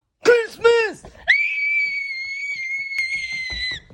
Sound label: aaaaaaaaaaaaaaaaaaaaah; christmas